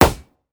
Balloon Pop; Counter-Strike: Global Offensive

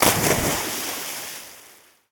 Lagoon Water Splash

